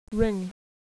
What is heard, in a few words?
ring